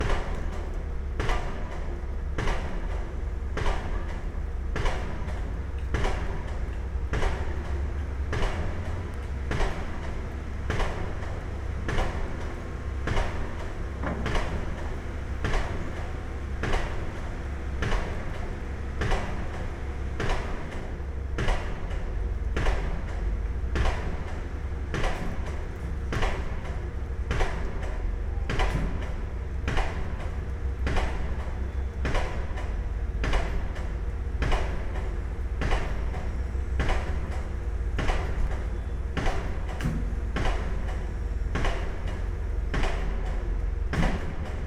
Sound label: Construction, Ext, Heavy machinery, Piledriver, Impact, Massive, Repetitive, Binaural, Loop; Hzandbits; Construction Ambiances